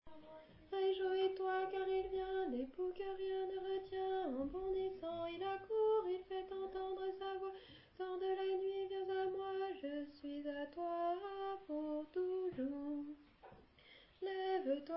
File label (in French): Voix d'alto